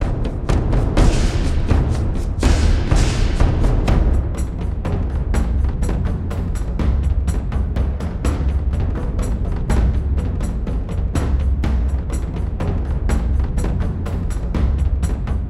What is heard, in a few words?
Caves Drums Loop; Ninjago Monastery; lego universe macos windows gamerip 2010